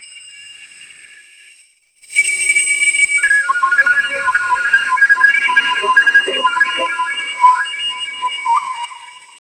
bubbling chemicals and hi

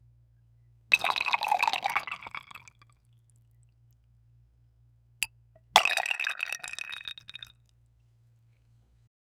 liquid sloshing inside a, bottle

